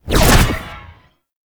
Teleport Start; Enemies; Abilities; Warframe; Tau; Dax Ninja

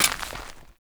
Step Dirt, Footsteps; Enemies; Foley; Warframe; Grineer Royal Jester

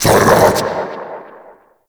Uriel Brimstone Cast Vocal; Warframe

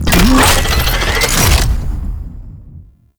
Mech Siege Mode Start; Warframe; Nechro Mech; Abilities

